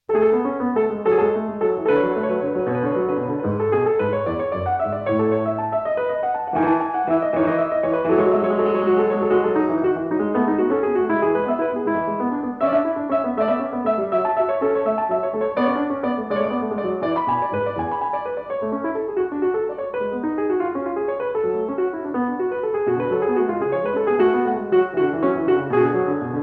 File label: Invention In A